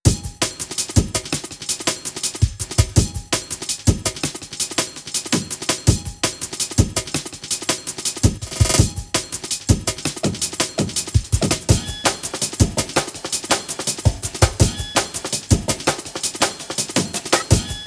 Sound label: (165 to 134 Bpm Extended Mix)